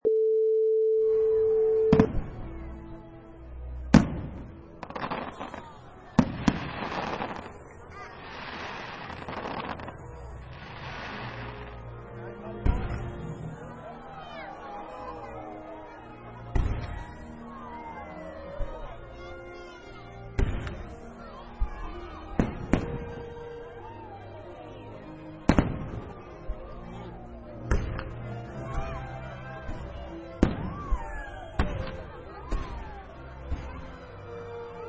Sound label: Firework Display